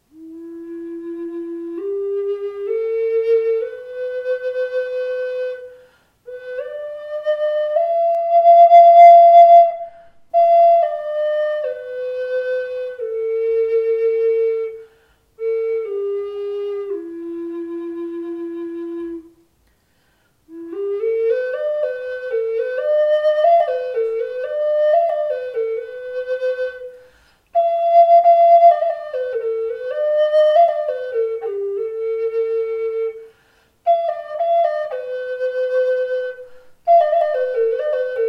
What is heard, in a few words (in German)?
Bambus F 432 HZ